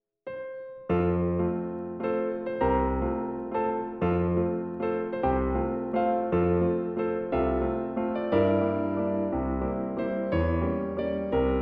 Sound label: Piano solo